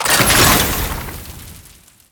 Alchemy Grenade Deposit Fire; Entrati

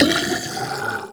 Flying Mouth Death; Enemies; Warframe; ManInTheWall

